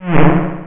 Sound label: squeak; Games World; Shareware Games; Despair 1.6; hinden squeak